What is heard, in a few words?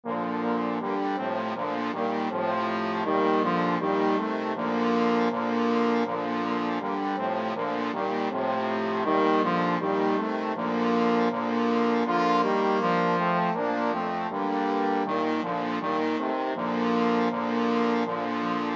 trombone quartet